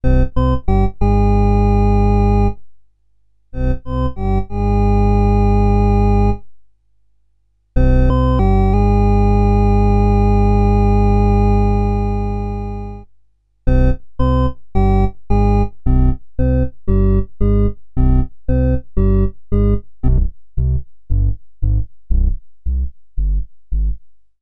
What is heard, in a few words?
wersi bass sine registers